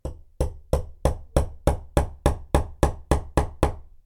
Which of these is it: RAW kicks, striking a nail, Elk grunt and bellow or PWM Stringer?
striking a nail